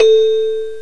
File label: celesta; GMPlay; Chromatic Percussion